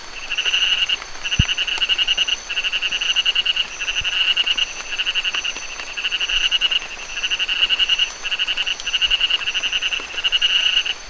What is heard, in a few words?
Ingerophrynus divergens